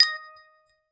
a crisp satisfying ping

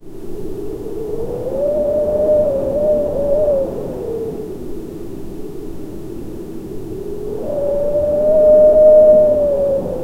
low howling wind gusts, mountains

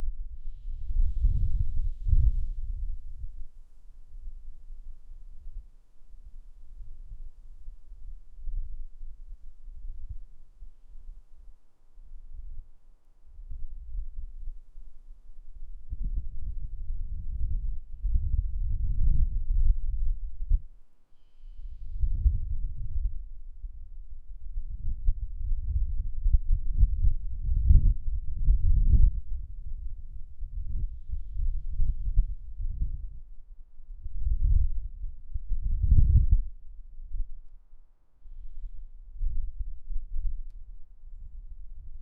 field recording of light

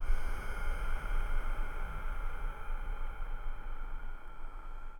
studio

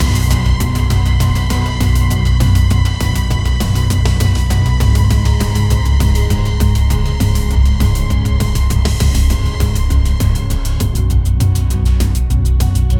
action movie title intro, movie

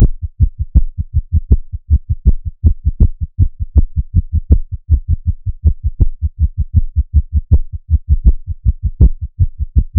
heartbeat 80bpm

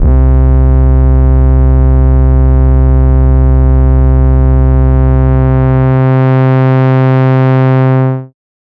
a powerful, 808

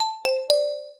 notification for messaging, chirp

